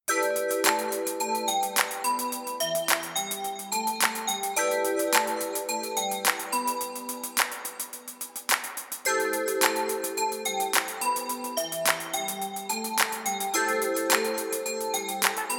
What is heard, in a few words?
telephone